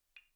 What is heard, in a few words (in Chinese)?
北梆子 单音 自然衰减 力度弱